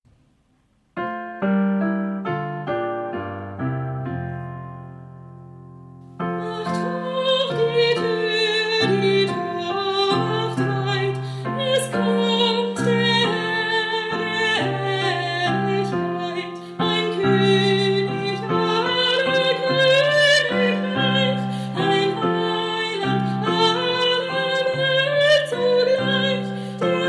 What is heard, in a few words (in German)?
Macht hoch die Tur Sopran und Klavier